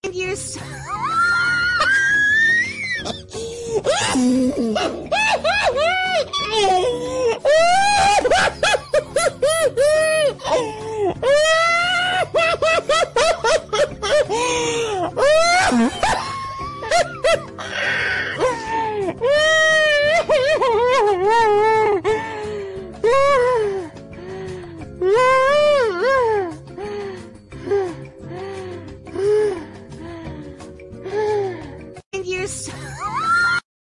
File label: laugh small